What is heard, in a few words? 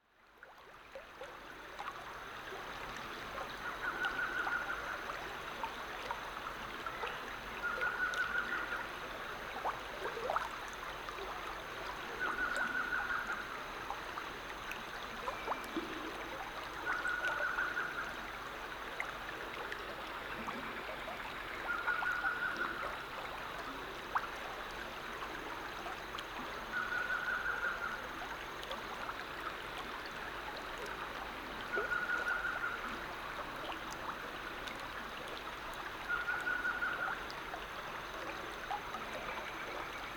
Nightsong Atmospheric; Aravaipa; Surround